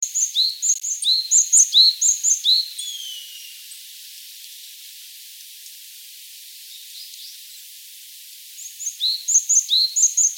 Parus major, Buteo buteo

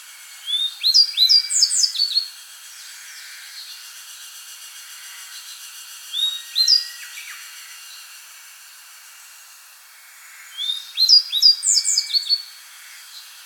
Emberiza variabilis

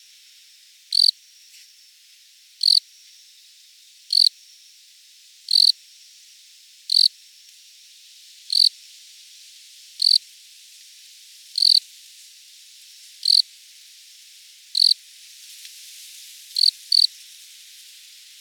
Loxoblemmus sylvestris

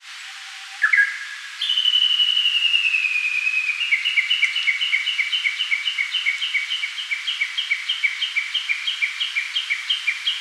Cettia diphone

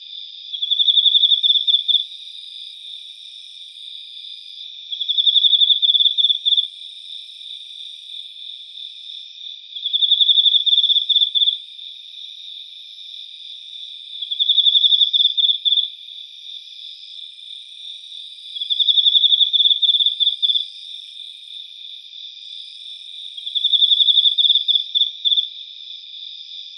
Teleogryllus emma

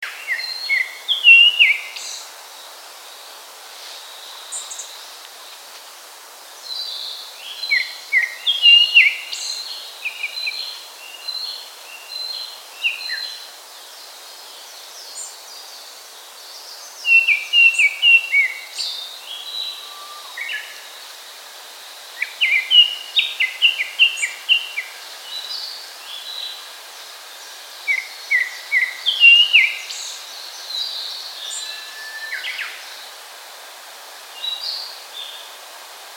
Turdus cardis

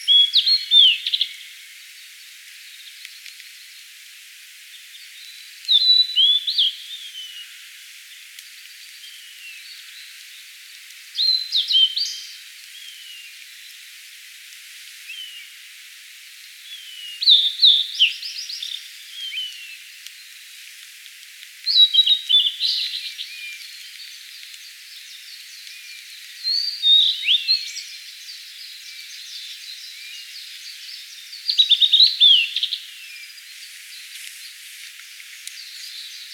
Cyanoptila cyanomelana

